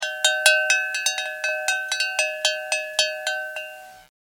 cowbell

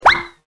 ZIP

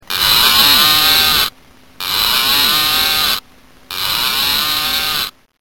cow

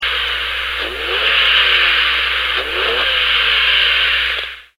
revving

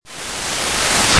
SUCK UP

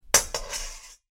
fork in bowl

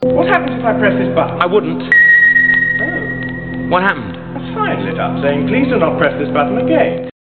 button

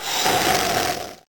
Sipping

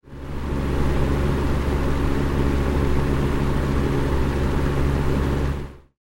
baby fan

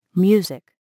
music

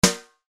brush hit

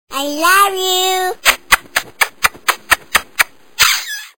baby kiss

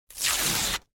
paper rip

